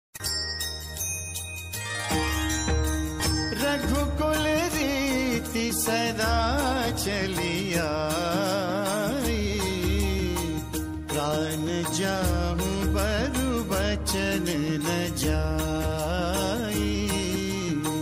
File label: Ramayan Song Ringtone